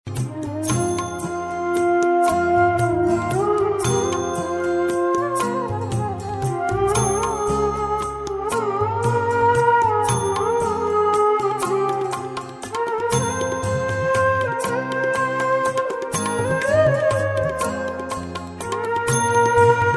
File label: Instrumental